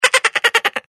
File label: dolphin; rocktopus